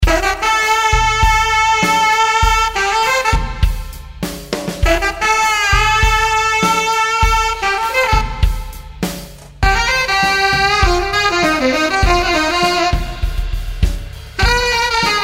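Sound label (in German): Saxofon, Kompressor und Hall im Sendweg; Eventide UltraReverb; Waves Abbey Road Studios Reel ADT; Noveltech Character, Waves CLA 76 Kompressor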